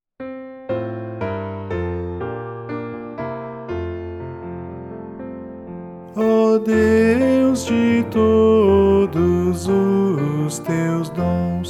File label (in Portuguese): voz piano